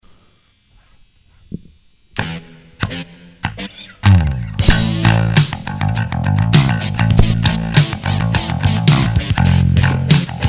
Bass

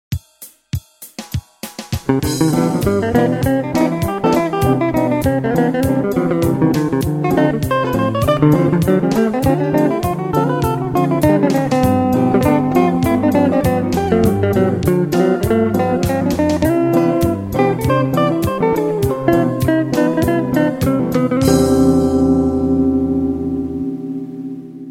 jazz

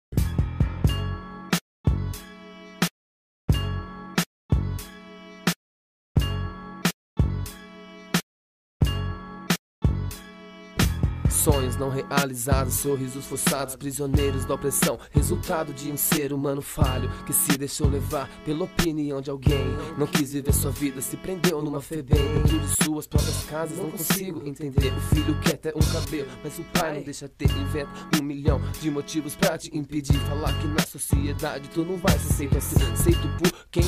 beat

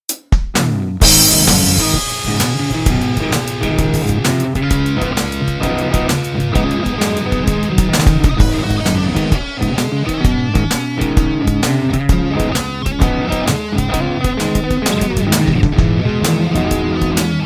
hard shuffle

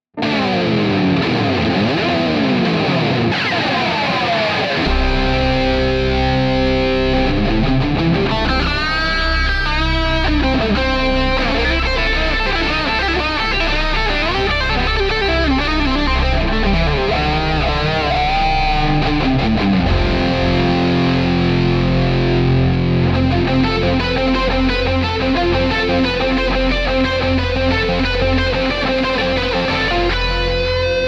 goodbye guitar

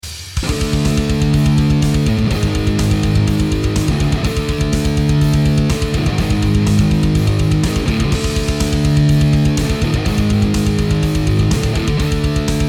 intro instrumental

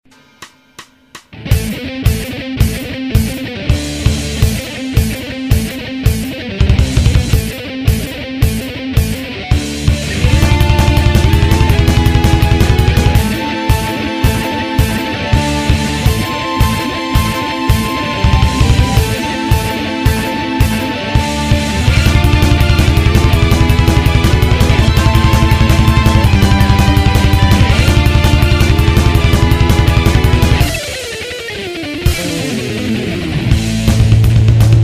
intro solo

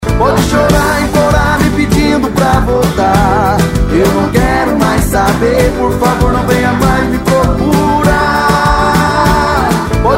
ring tone

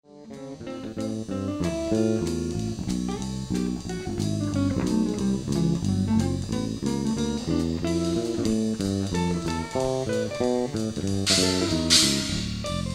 jazz jam session

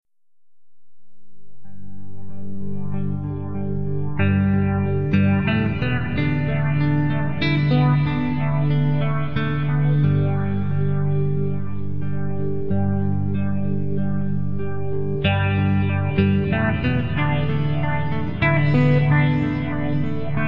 stanley instrumental cigarrete

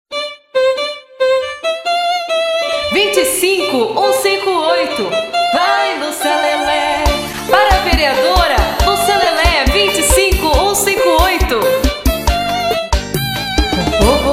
jingle

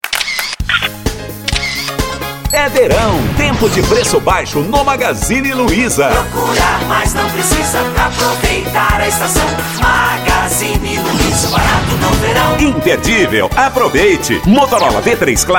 jingles

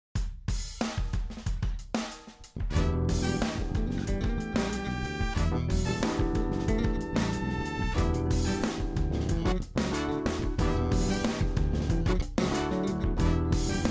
bass free

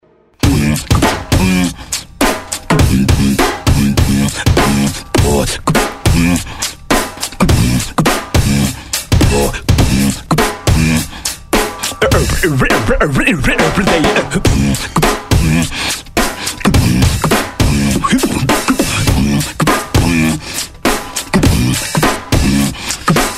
beat

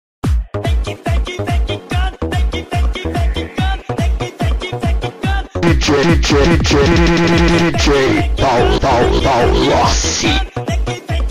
electro remix

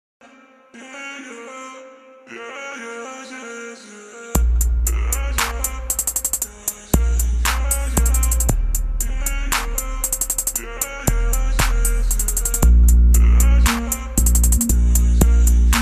relax vibe

